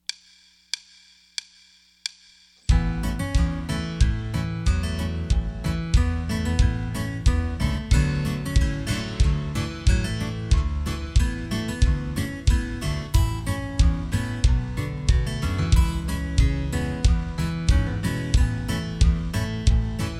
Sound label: Tribute Band